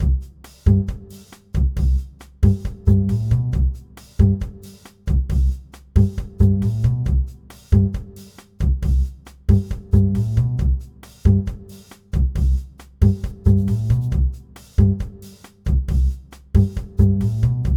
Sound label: bgm